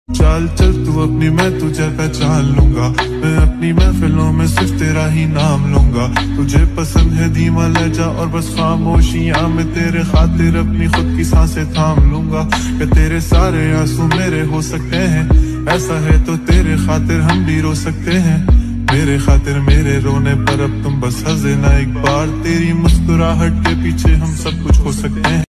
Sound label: hindi rap ringtone